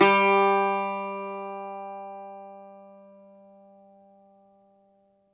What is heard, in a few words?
Greek Bazouki, plucked strings